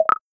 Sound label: guest joined call; Zed; multiplayer code editor